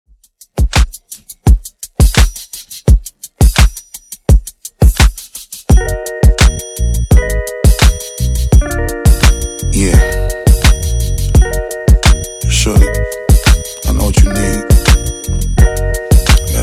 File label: 85bpm